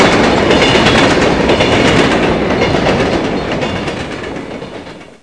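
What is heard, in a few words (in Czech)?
zeleznice, railway; pavouk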